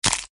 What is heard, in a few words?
hit; freak fortress 2; employee